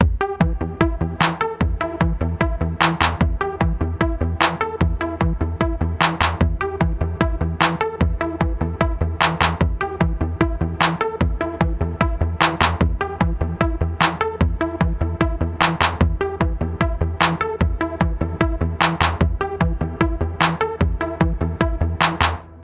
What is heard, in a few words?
Flying Over The Top Epic